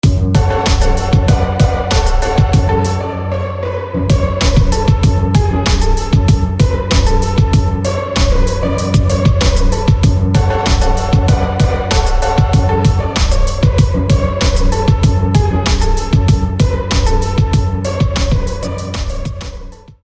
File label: Dark Pizzi